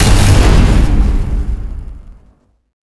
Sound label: special explosion; balor